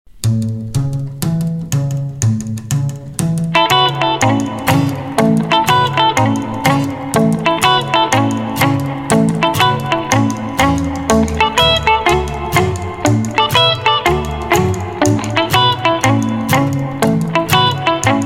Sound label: ROCKAB, rockabilly; Rock’n’Roll